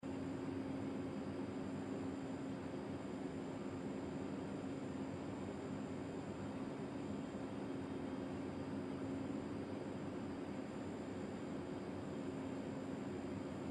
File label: The End Ambience; escape the backrooms